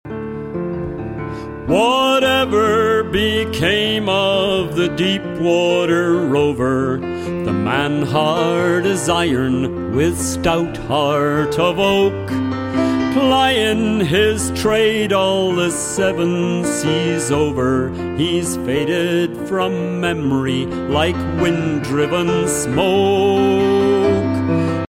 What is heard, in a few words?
siren song